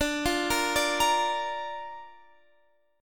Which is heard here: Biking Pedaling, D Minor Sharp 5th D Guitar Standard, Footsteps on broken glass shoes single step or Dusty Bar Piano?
D Minor Sharp 5th D Guitar Standard